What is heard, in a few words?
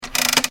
camera crank down; fnaf 3